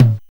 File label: mid tom drum sample a sharp key, tom single hit tuned to the A# note